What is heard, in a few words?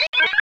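sphere blips medium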